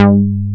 G3, J-106 BS; Drums In Your FACE!

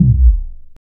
ULTRA ACID, Synthy Tonal; Distored Reality

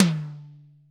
TOM TOM, ACOUSTIC KIT; Greytsounds VOL-1

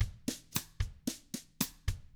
COUNTRY, Drums; 111COUNTRY

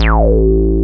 DB9 BASS; Techno Trance; Synth Basses; E-MU Formula 4000 Series Vol. 2

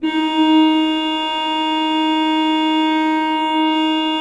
D3, Cathedral Organ; ILIO; Propeller Islands

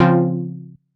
stab blurt F, Noise; stabs

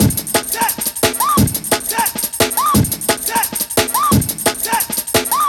175, classic dnb breaks

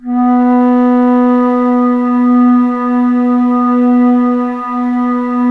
ALTO B2, ALTO FLUTE; USB Soundscan vol.23

